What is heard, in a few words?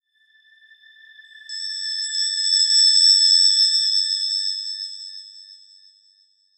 SHIMMER WIND, Shimmer Wind Chimes Tonal Swell High; Blastwave